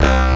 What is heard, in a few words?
Rockn Bass (C), Synth Bass One Shots